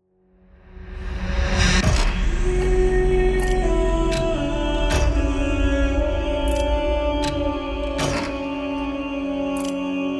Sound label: countdown horror crime vocals epic industrial hybrid horror music